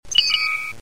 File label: beeping sound